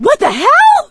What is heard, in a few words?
grabbed by smoker; voice; Left 4 Dead 2; producer